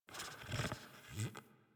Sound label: Tame Sleep Idle Snore Fidget; Enemies; Warframe